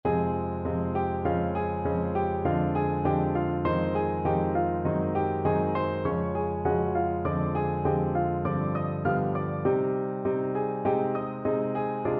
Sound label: quatre mains, piano duet